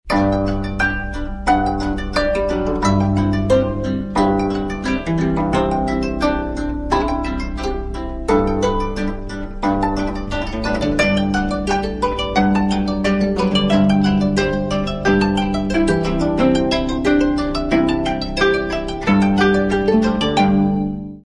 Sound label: Deep Blue Sea, Ringtone; LG